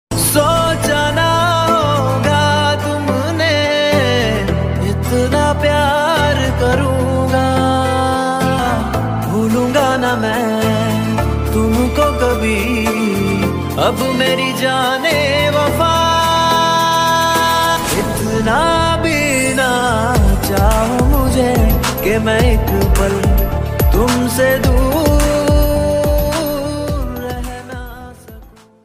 Love Ringtone

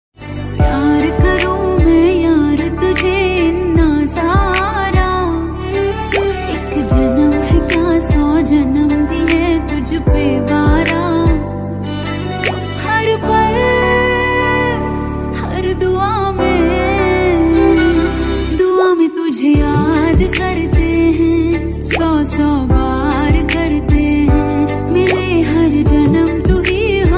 Ringtone Female

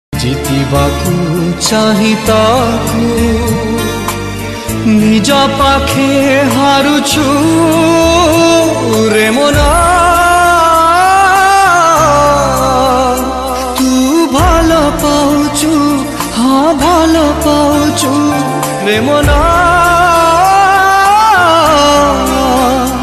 Sad Odia Song; ringtones

